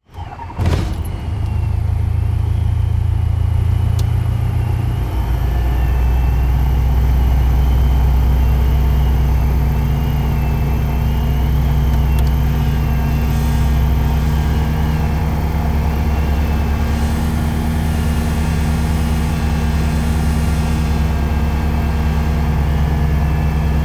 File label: cabin noise